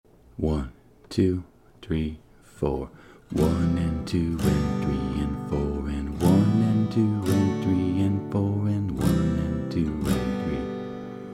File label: adding pushed rhythm